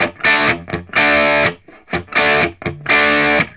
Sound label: RIFF 125, Vintage Blues Guitar; USB Soundscan vol.22; AKAI